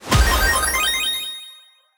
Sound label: Goal; pinball; Chocolate